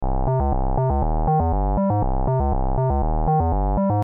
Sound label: synth